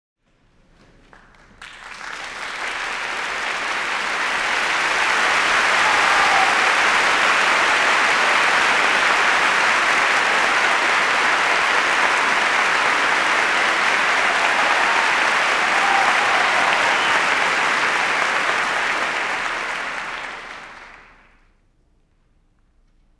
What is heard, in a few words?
Applause; 1975